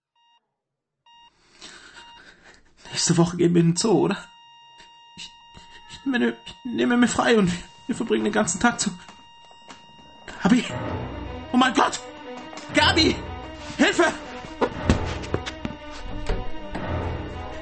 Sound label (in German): geige